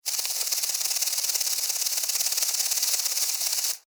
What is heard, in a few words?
Beetle, Walk, Fast, Shake, Wings, Insect, Bug; Sound Effects:Animals:Insects; Beetles; Blastwave